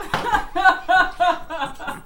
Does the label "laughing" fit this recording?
Yes